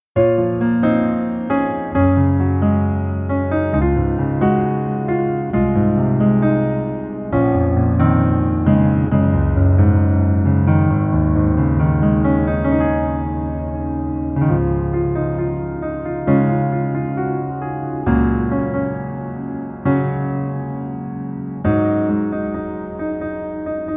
Piano ver 멜로디 MR 남자 키

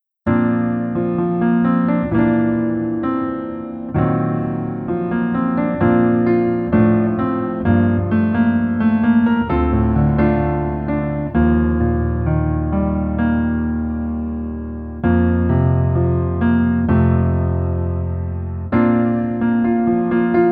Piano ver 멜로디 MR -1 키

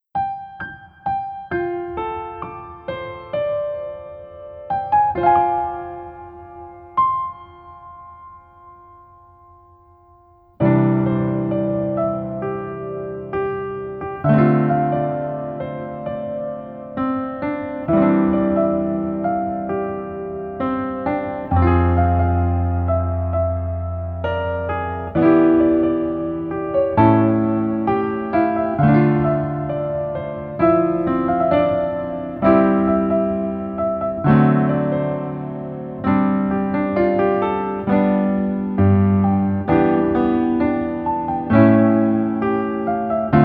Piano ver 멜로디 MR

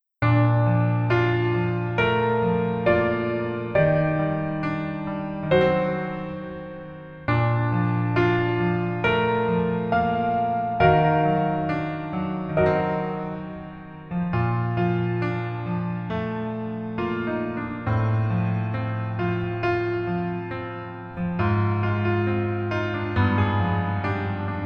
Piano ver 멜로디MR -1키

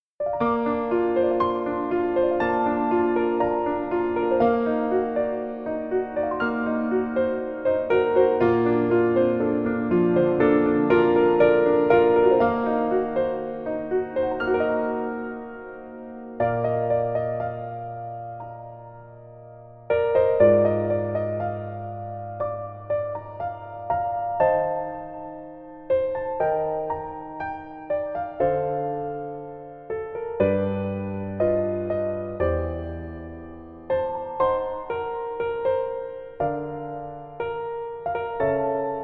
Piano ver 멜로디MR 여자키

